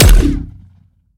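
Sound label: Sci weapon Shot Medium Gun Warp Sniper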